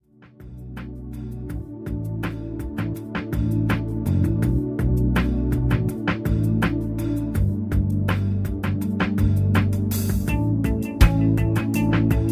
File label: rhythm